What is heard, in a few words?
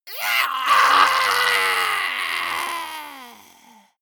monster die high